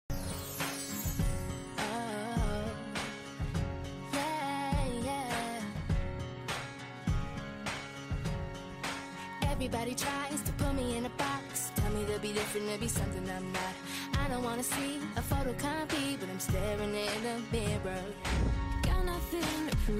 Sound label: Ringtone